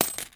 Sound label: coins bag